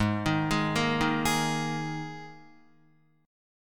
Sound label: Ab Suspended 2nd Guitar; arpeggiated; JGuitar